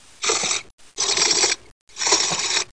Wheel; Bible Fun Pack; Education Publishing Concepts; NOAH

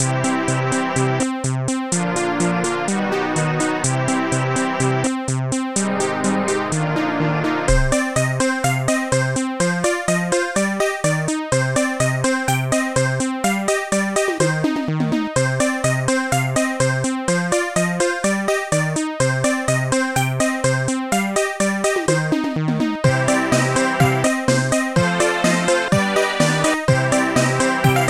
tune

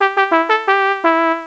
HAHA; Stratego

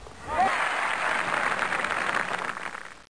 Level complete; Amiga CD-Sensation; Golden Games; Tron

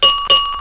Notification; LeeMail

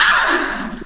acid aaahh

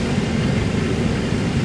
VENT; Messiah

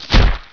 landf, monsters assassin; Heretic II

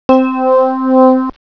org 1 c; Electronic Whole Earth; MUSIC

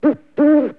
menu popup; wolfman

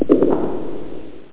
Step; MarcDrive

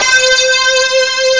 guitar blow

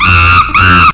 Monkey Scream

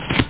Flip; Weird Science; Amiga-CD32-CDTV; cards-&-quiz; demon

